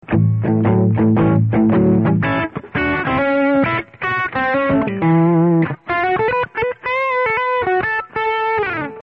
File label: johnson bridge dirty, pickups